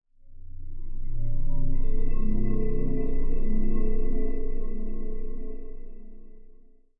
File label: Nostromos Find C2, dark pad